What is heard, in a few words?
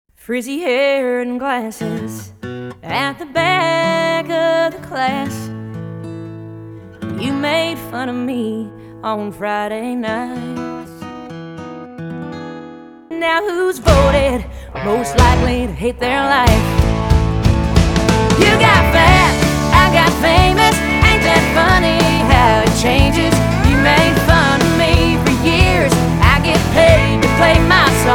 186bpm; showcase couples proam music